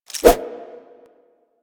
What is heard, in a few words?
Nunchaku Start Whoosh, Weapons; Warframe; Tenno